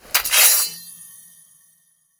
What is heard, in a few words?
warrior draw sword